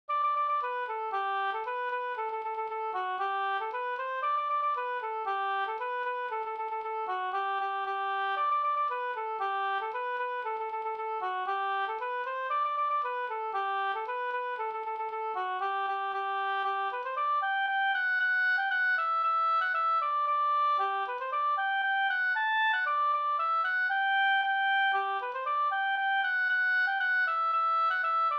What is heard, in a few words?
polka; Tjuvagubbarnas polka Hl